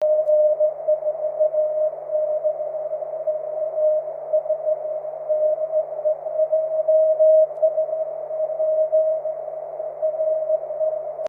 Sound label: cw; 23cm